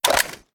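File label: sniper reload mag out; gmod; iw8; alpha50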